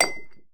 bolt glass, material; STALKER; IWP

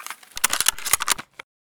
misfire, sv98

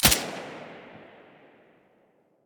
silencer stereo, weapons

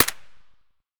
akm silencer distant, weapons; ak103; STALKER; IWP

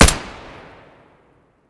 muzzle glock17; weapons

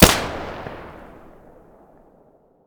muzzle stereo, weapons; S.T.A.L.K.E.R.; IWP; fort

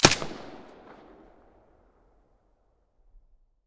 mp5 silencer stereo, weapons

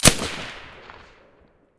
silencer dvl10 stereo

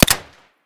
pm silencer mono, weapons

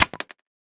grenade wood; STALKER; IWP

